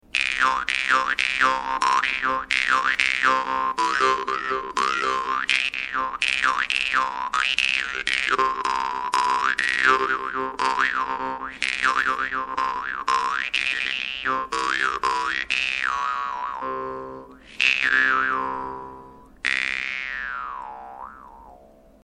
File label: doromb; hungry doromb consul; vargan